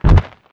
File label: walk dirt; gamerip; shattered horizon 2009